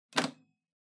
Public Phone Tubular Key Turn

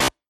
Quiz Wrong Buzzer

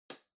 Air Circulator Far Switch

